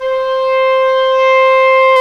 WND OBOE, Oboe Room

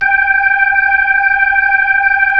B-3, Keyboards of The 60's and 70's; Club Xfade

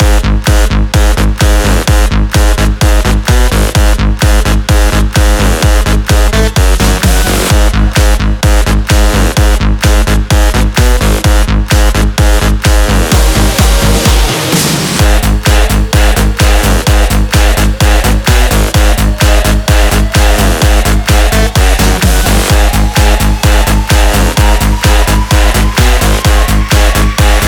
128BPM Mix, Root G#min; Vengeance; Essential Clubsounds Vol.5; Demo Constr Kits; Break the Chains

